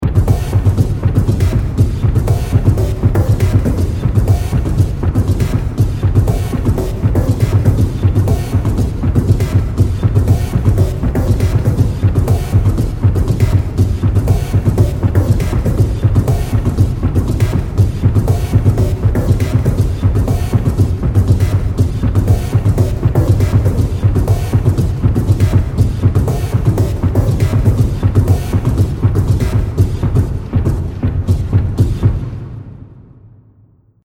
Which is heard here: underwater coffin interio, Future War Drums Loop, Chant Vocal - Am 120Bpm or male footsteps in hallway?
Future War Drums Loop